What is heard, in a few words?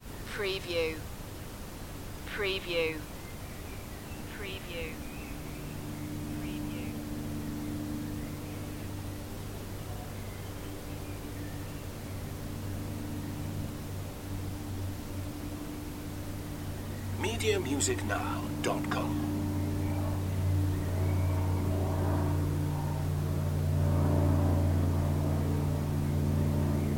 propeller-driven airplane